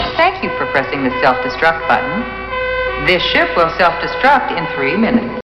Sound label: self destruct button; spaceballs